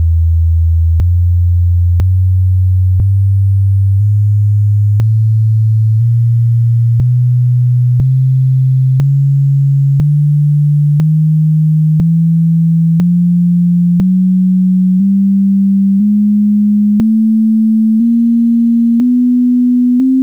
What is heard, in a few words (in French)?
toutes, tonalites midi, 48 kHz